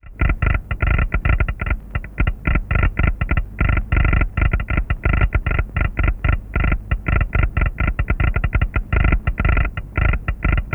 seinen telegraafkantoor

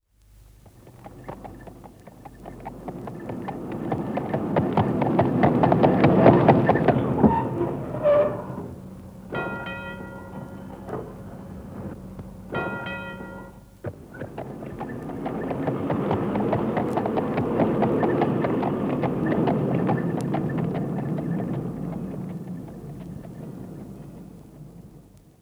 paardentram

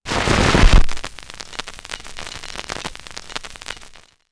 grammofoonnaald opzetten

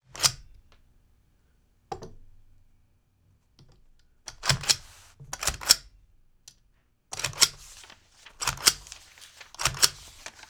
stempelen

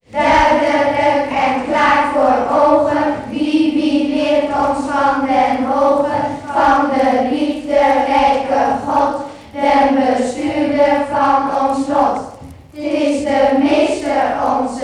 klasversje; god-meester

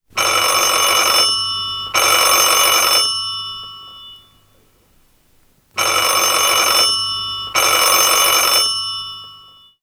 telefoon rinkelen

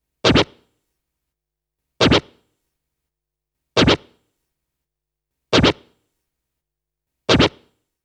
scratchen lps

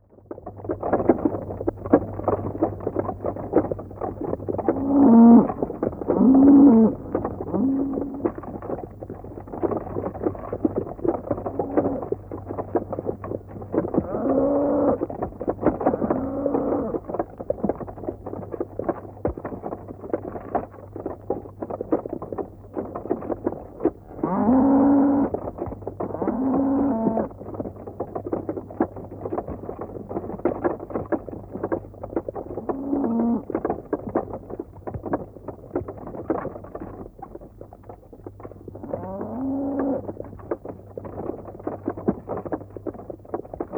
ossenwagen loeien

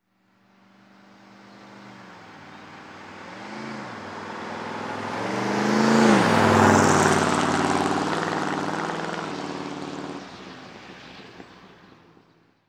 ford hotrod passeert

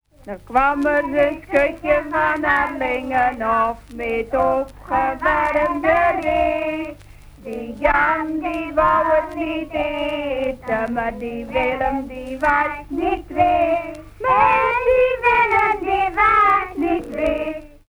urker kinderliedje

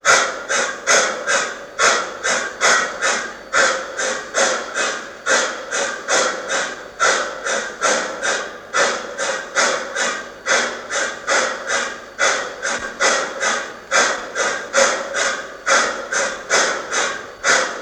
mijn grote waterpomp

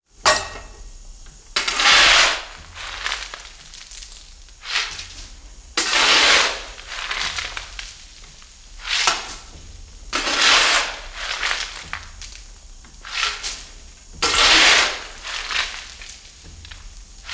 stoomgemaal kolenschep

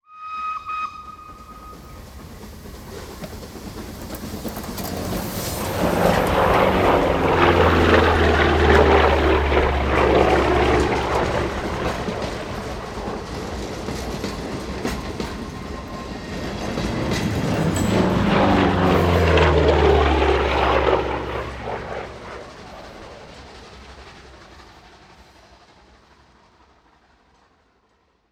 WOII vliegt trein

